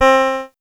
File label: Casio VL1 Guitar; Giga Samples Collection